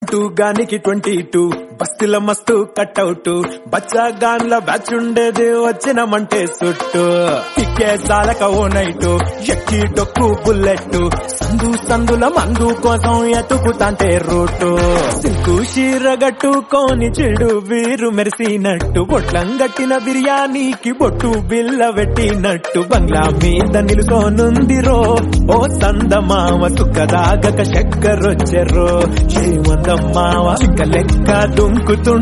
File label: tamil song trending tone